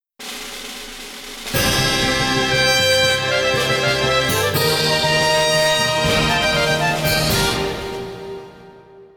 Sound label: Fanfare